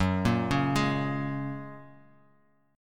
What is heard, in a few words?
F sharp Major Add 9th F sharp; arpeggiated; 14,13,11,13